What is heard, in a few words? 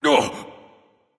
surprise; smite